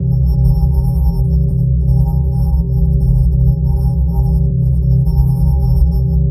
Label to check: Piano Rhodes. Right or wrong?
Wrong